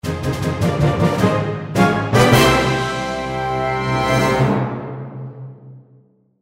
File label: Win Win Win; Boom Beach